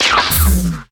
power off

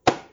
attach, ancle monitor

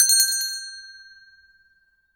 objective complete

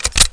charger, mp7; weapons

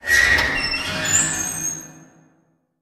jail door begin close

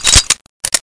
break eject, model3; weapons

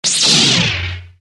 saber on, sword; phantom

